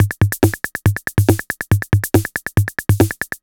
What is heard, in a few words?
Tape 140 bpm, retro drum machine samples, Loops; MT40